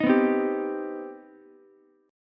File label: C Minor Major 7th, Ukulele; strummed; JGuitar